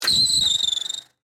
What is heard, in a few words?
Bicycle Brake, Squeal, Schwinn, Vintage; Sports; Bicycles; BlastWave